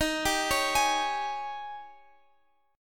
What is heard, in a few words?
D sharp 11th D sharp, Guitar, Open F; arpeggiated; x,x,15,14,16,15; JGuitar